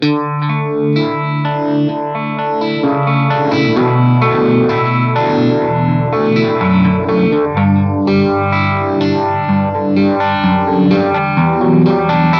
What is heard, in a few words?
orange 335, rotovibe